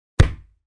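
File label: Kick on the ball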